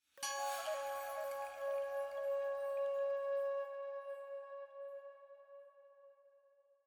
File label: item Jingle Flute Chime C sharp; Ori and the Will of the Wisps